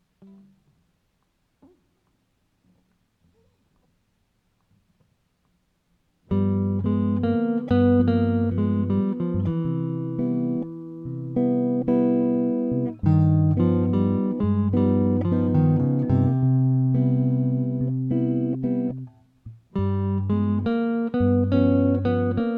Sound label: II V soloing in C, guitar